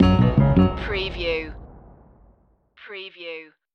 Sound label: funky hop stinger; rhythm and blues